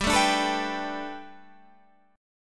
B Minor 7th Sharp 5th G, chord

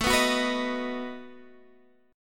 B Major A

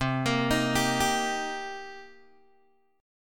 C Minor 7th, Guitar, Open G; down; chord shape

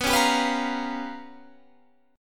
G 7th Sharp 9th Flat 5th

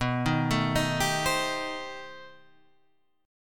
B Augmented 7th Flat 9th B; arpeggiated; B+7b9; 1,3,sharp5,b7,b9; JGuitar

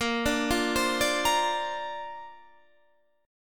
A sharp Major Add 9th, Guitar Standard; arpeggiated

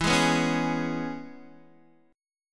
E 6th, Guitar, DADGAD

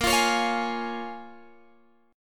Bb Minor 7th Bb, Guitar, Open G

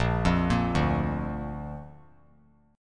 A sharp Major; arpeggiated; JGuitar

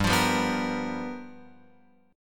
G 7th Sharp 9th G chord, Guitar Open F; G7#9 strummed; JGuitar

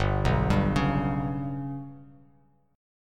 G Major Flat 5th G down Bass Standard, GMb5 chord; GMb5 arpeggiated; JGuitar

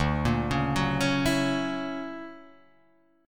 D Augmented Major 9th down, guitar; D+M9 arpeggiated; JGuitar

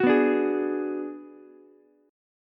G sharp Minor 7th Flat 5th G sharp down Ukulele; G#m7b5 strummed; JGuitar

